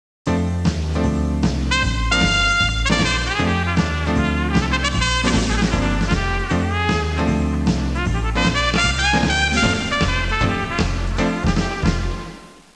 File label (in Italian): suonerie musica jazz